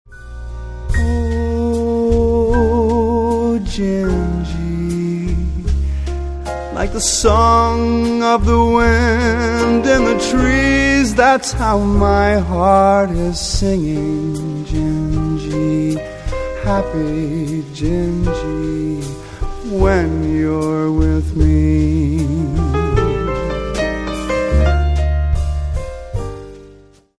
swing; Keyboard, Bass, Drums, Vocals

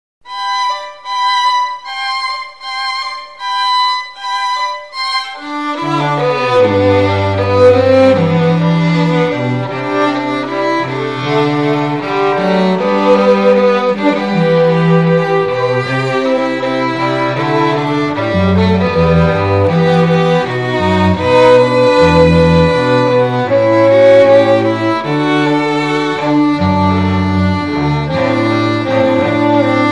string

